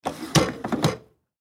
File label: Foosball Table; Foosball Table Players Triple Move Out In; Sports